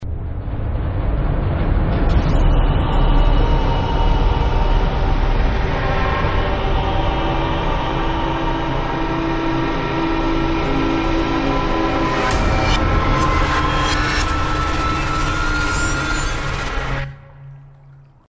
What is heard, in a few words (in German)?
bossende; Titan Souls